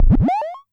Amdek Perc

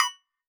Tech Drum Hit, techno 120bpm

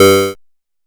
PO Synth G; SYNTHS; CHIPSHOP DELUXE; CHIPSHOP HITS

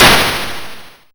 Snare, YM, CHIPSHOP HITS DRUMS; ChipShop Deluxe

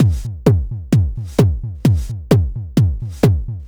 Beat (130BPM), techno; techno drum fx

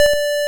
Guitar D2; multi-samples; CHIPSHOP DELUXE

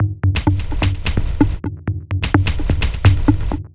Beat 128BPM (Full No Kick), techno; techno drum fx

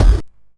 ChipShop YM Vox Grip; CHIPSHOP DELUXE; CHIPSHOP HITS

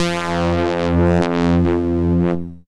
SID lead F; ChipShop Deluxe; ChipShop Hits

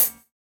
Open hat, techno drum

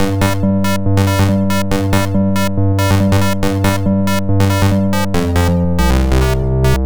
140 Synth G, 140 BPM; loops; CHIPSHOP DELUXE

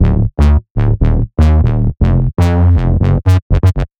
Tech Bass 120 D, techno; musicradar; 120bpm; Bassline

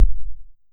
Vermona Kick

